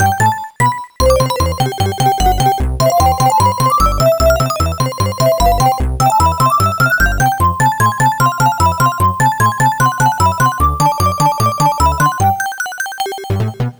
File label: amiga in game